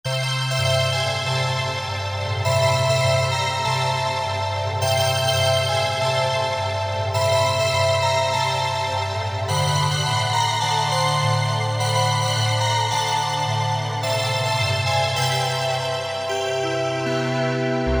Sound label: Ice Rink; Generalmusic Equinox